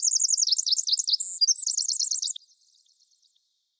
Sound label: ambience good bird; smite; conquest